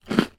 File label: human eat crunch bite biscuit cookie mouth closed single; zapsplat